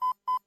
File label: cursor blink, terminal